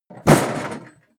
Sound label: toolbox handling rough